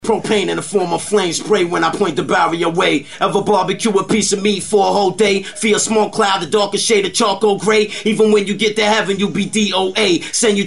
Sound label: acapella